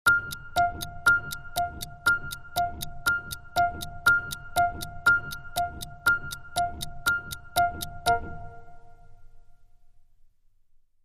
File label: Dramedy Sting, No Strings